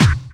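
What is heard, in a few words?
snare drum bass drum, Dance Mega Drums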